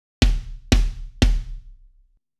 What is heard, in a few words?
kit cut